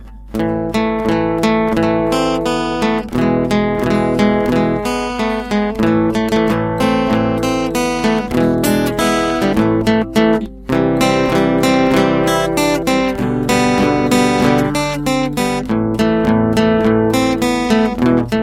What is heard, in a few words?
guitar; STALKER